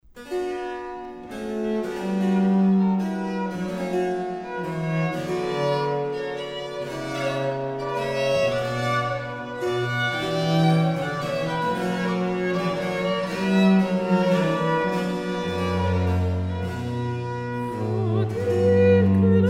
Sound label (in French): Air; Jupiter et Europe